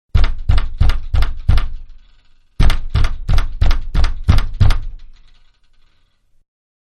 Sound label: Pounding On Wood Door, Inside; Wood (Sound Effects:Materials)